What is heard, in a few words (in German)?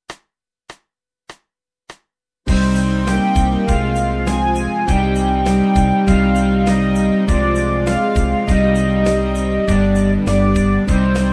Frühling Ukrainisches Volkslied; Schulband Musizierklassen; horn orchesterfassung